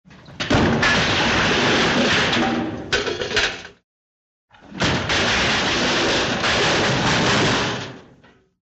zvuk grohota pri padenii

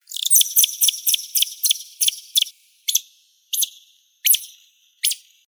golos altajskoj pischuhi

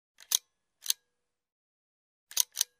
zatvor starogo fotoapparata

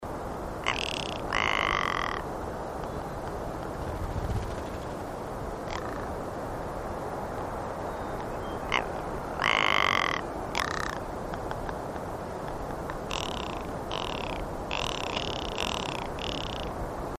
tundrjanaja kuropatka

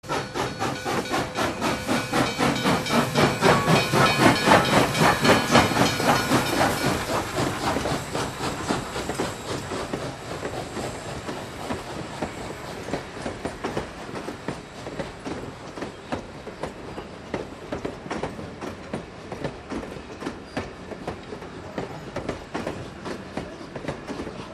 zvuk proezzhajuschego parovoza

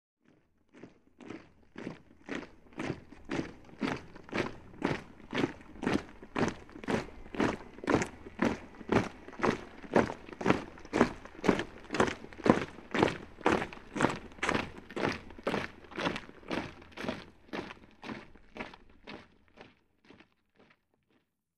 zvuk marshirujuschih soldat

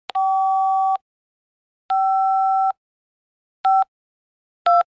tonovyj nabor na telefone; 3D звуки

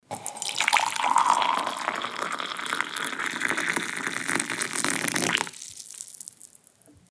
kipjatok zalivajut v kruzhku

